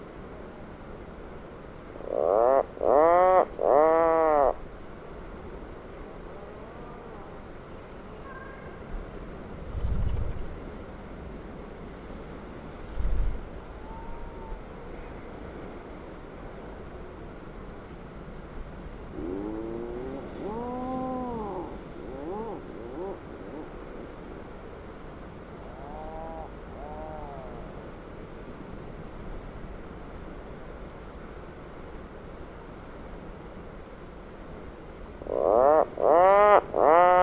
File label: Atlantic puffin